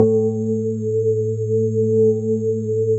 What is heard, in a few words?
GLASS KO; Synclavier Sampler Library; KEYS AND GUITARS